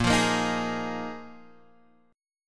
A sharp 7th Suspended 4th A sharp, Guitar Half Step Down